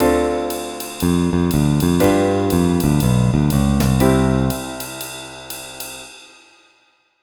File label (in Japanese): ドラムテンプレ